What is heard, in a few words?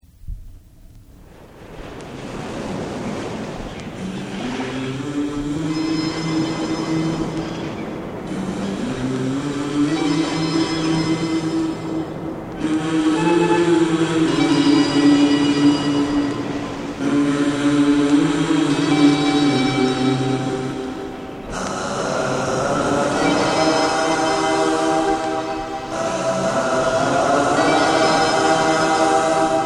SUPER DISCO HIT